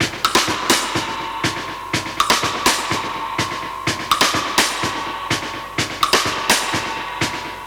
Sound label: 125 Classic Breaks, 125